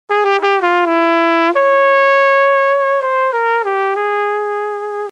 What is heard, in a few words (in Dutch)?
bugel; blaasintrumenten